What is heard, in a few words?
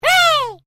Created Creature Small Hooray, Alien Sound Effects; Created creatures, vocalisations and pretend speech